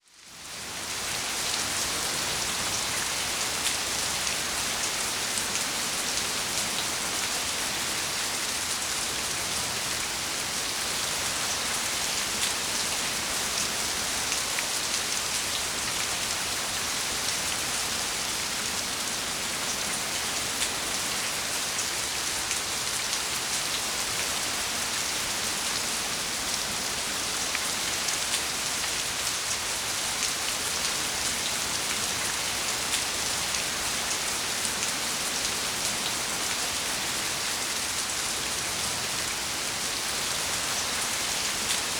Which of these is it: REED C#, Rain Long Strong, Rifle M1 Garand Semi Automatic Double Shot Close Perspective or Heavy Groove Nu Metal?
Rain Long Strong